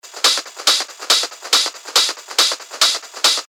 140 BPM, techno top loops; Viper; revealed techno top loops vol 8

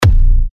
A#, techno kicks; Mogul; Revealed Techno Kicks Vol. 10

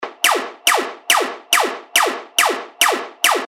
techno loop, 140 BPM; Stormtrooper; Revealed Techno Top Loops Vol. 10

